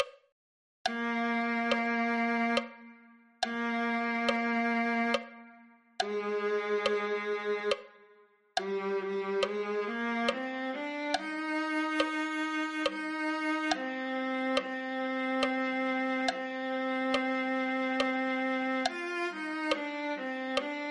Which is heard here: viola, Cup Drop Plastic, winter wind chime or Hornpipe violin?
viola